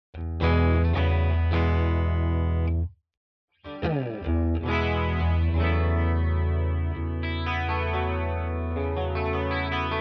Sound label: Walrus Fundamental CHORUS Light soft